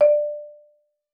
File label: marimba D5; Xsample VOL-4